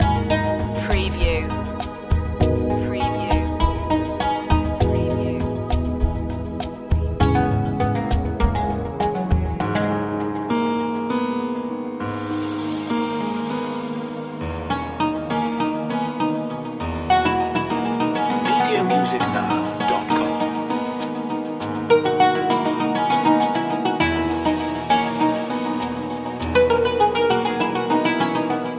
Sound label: lost dream loop